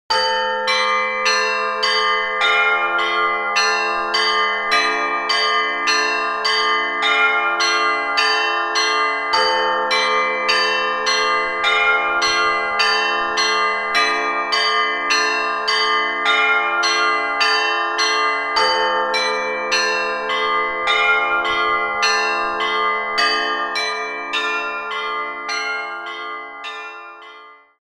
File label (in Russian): zvon